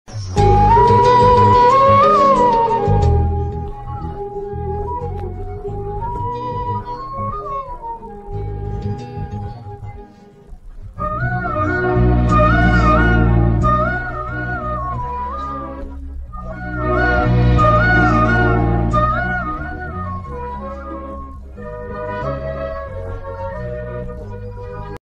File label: heart touching instrumental ringtone bgm ringtones, telugu; bhadra